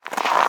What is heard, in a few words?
snow wading; Scrat